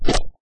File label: slime attack; mob; Minecraft